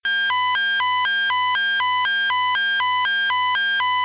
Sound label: eas radio alert